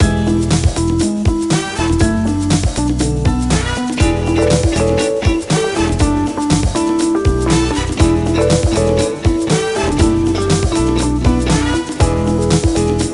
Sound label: Dance Music